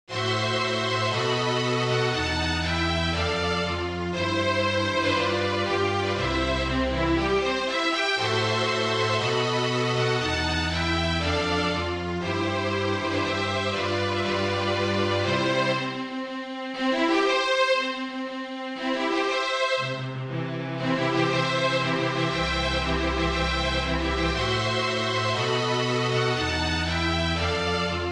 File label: Wed March, string quartet; Mendelssohn